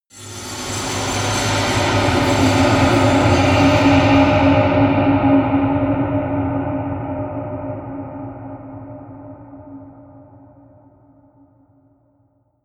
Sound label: eerie industrial swell heavy; sweeps-swells